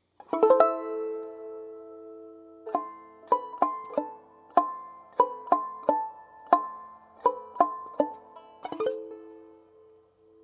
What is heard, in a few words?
carol of bells fast, banjo; Christmas